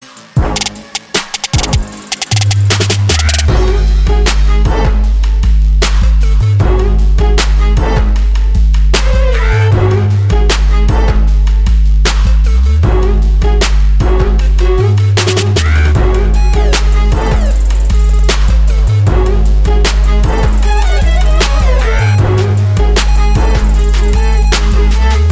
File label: Hicaz Trap